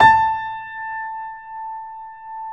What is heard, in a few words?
Keyboards Acoustic, GRAND P; USB Soundscan vol.17